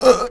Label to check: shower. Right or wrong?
Wrong